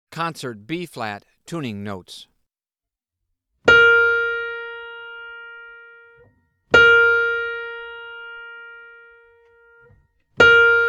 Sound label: Tuning Notes; James „Jamey“ Aebersold Play-Alongs